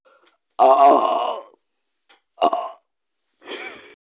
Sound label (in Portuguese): arroto potente